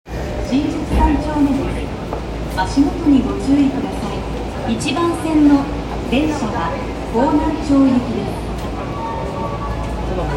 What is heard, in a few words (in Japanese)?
丸ノ内線新宿三丁目北